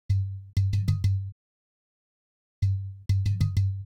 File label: Udu; Sonic Foundry (Sony Creative Software); Joe Vitale Latin Percussion